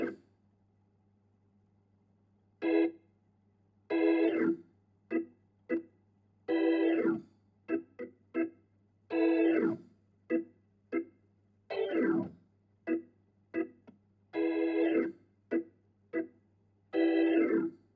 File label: Hammond